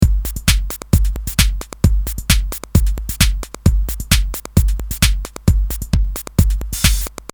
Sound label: 132 beat avec souffle; Loop Mania Sampler Pack